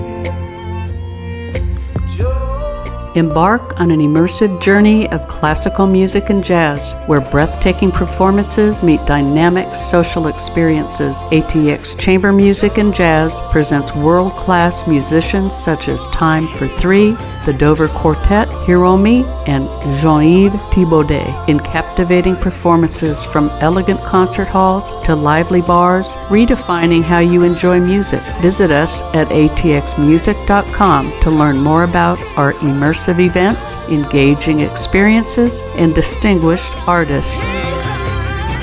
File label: Chamber music Jazz; ATX; PSA; Community Partners